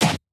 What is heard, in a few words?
hawaiian pop